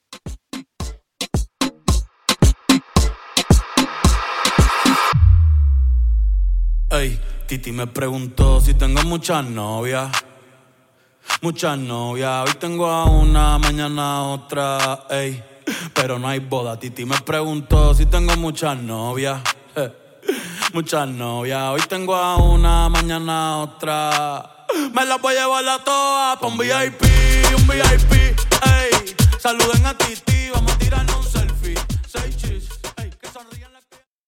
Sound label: Intro Outro 110 BPM